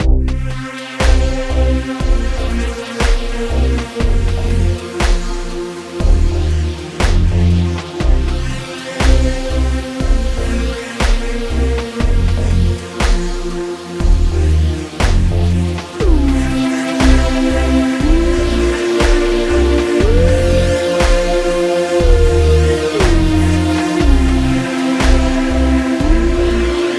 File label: MIDI